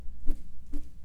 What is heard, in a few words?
Arm Movement